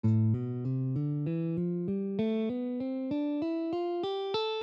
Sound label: A Minor Aeolian, guitar